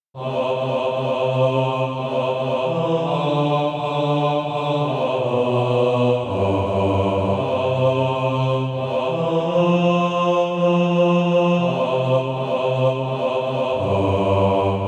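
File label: bass, SATB Practise Music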